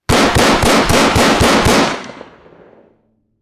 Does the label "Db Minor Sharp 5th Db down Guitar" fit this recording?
No